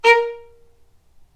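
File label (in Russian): звук ноты ля диез первая октава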